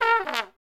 trumpet greasedown

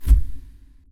cancel, button

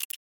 glasses up

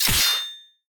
sword slash

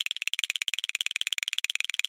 shivering

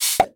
cork plug

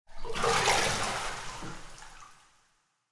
bath out

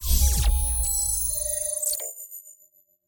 data display, button

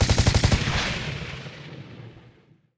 machinegun

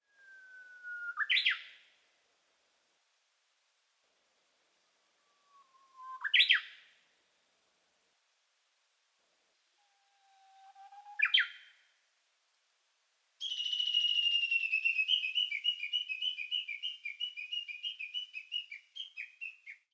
horornis diphone twitter

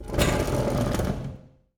classroom desk standup

